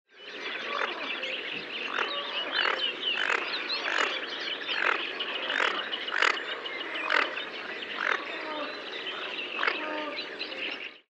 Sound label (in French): Fuligule morillon Aythya fuligula; Natura 2000